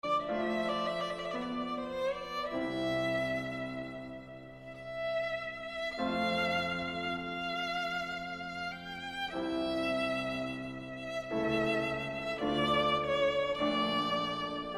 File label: Violin Sonata in D Minor, La Folia